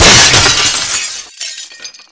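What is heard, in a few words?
glass window break medium; Explosions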